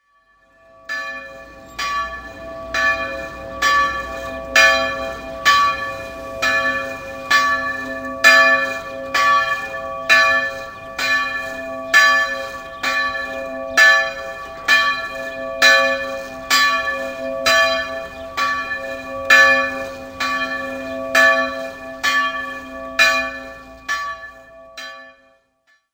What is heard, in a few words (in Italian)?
campane